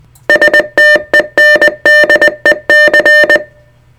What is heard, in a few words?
STENDEC 600hz 20WPM